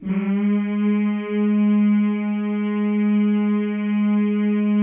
male; Spectrasonics; Symphony of Voices

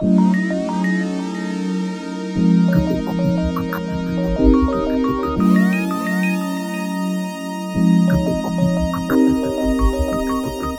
Melody, Hip-Hop

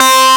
Synths Root C

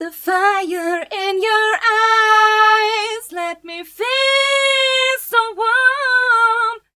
f mel g, fem Vocals

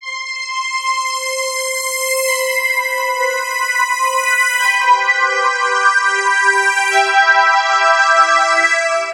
lead (string); xtra lead; Sonic Foundry (Sony Creative Software); Ugly Remnants VOL-2

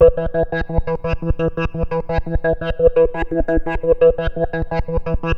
T-WAVE, Synth Waves

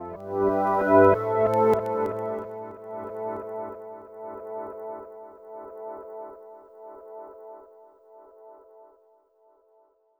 SYNT, Hip-Hop & R&B; USB Soundscan vol.47; AKAI

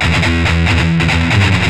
Riff, Industrial; Nasty

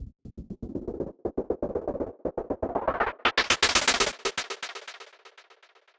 Gated Noise 120BPM; musicradar

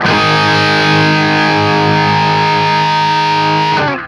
5TH G#2, Guitar; 5THS; East West; Steve Stevens Guitar Samples Collection

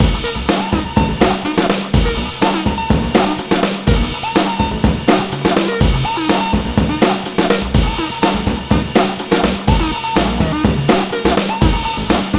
TRAILER BREAK 124 bpm; POTBreaks

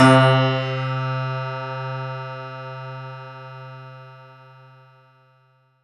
C3, Synth Line; 90 s Sample CDs; NiceBeats; SynthLine VOL-23

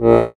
DIATON BAS, AKKORDEON, Alpine Volksmusik; Best Service

